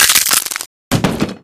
axe break; weapons; source engine; left4dead2

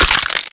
fall pain; video games; source engine; tf

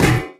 physics turret impact md

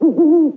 dangerous creatures question; windows 9x; sound schemes

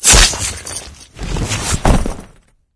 stab and drag; ambient; source engine; ep2; outland

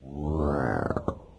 mystery asterisk, windows 9x sound schemes

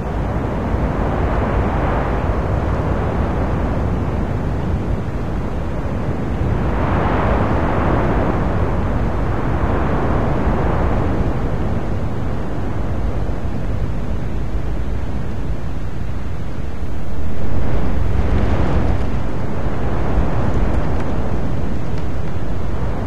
empty street wind loop; video game; Left 4 Dead 2; Source Engine; ambient

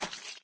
flesh, footsteps, run; player; source engine; left 4 dead 2; boomer

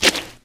paint, footsteps

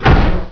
door stop; Source Engine; bshift

